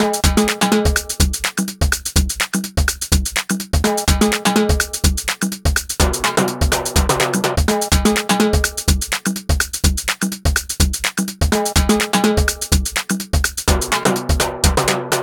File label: 125, Digital Breaks; Loopmasters; Jon Carter & Alex Blanco; Block Party Essentials